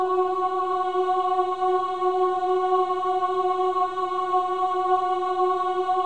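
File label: choir ahs F#4; Spectrasonics; Symphony of Voices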